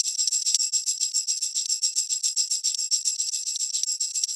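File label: percussion, Roots Funk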